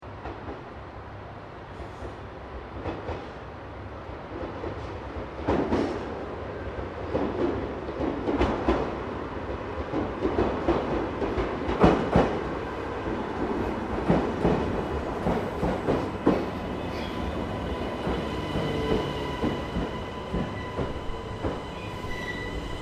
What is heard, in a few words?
Train JR Regional Aomori Local Exterior Stopping, Japanese Trains; Train Sound Effects; Vehicles